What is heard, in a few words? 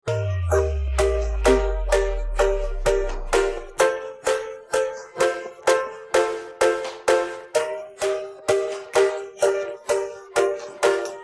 Twins Instrumental